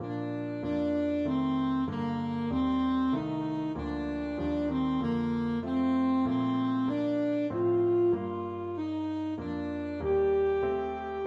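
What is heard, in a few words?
alto saxophone; hereford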